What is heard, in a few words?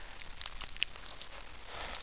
gun mov; hgun